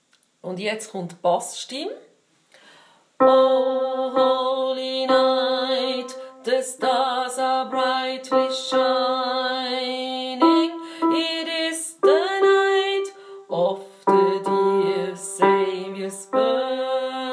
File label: O holy night Bass